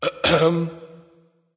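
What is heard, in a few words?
nonverbal clear throat; smite; voicepack; ullr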